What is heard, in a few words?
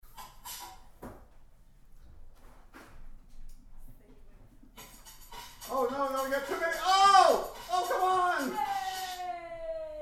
first noises